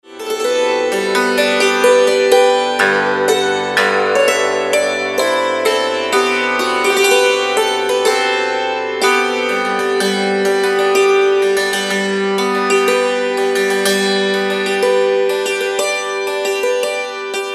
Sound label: wedding march